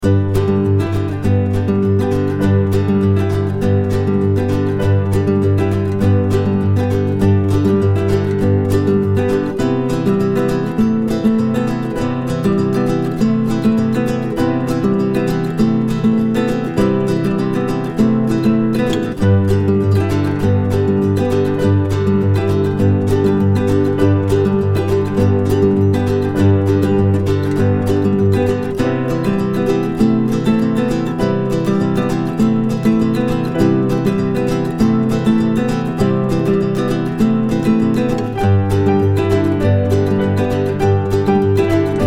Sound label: Relax A While